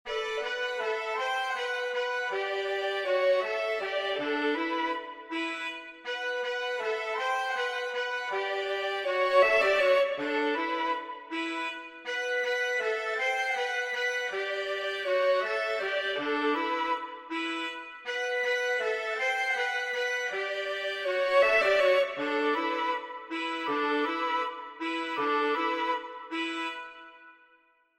focus Violon; Les Valkyries

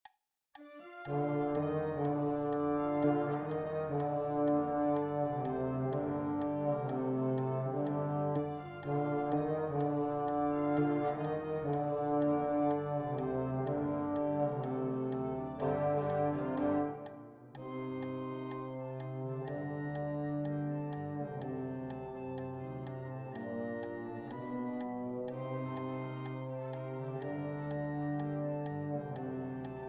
Orchetres Focus Trombone; Les Mysterieurses Cites d'or

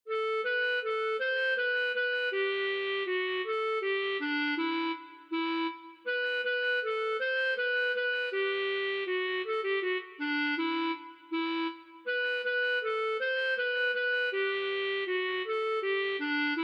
Saxophone de poche en Ut; Les Valkyries

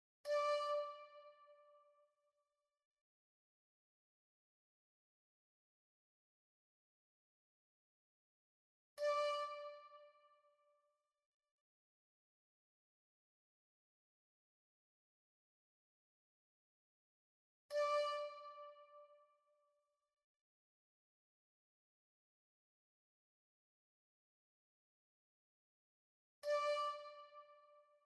Flute Irlandaise

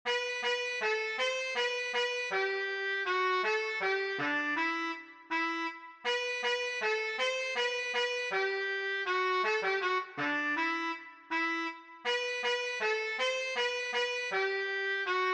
Chalumeau soprano; Les Valkyries

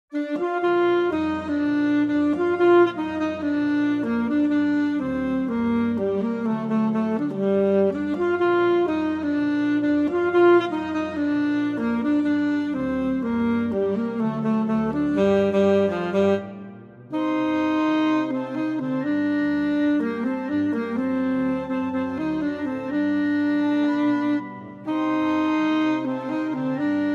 Orchetres Focus Sax Alto; Les Mysterieurses Cites d'or

